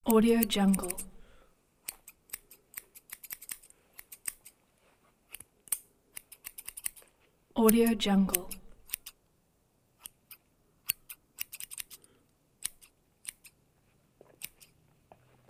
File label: scissors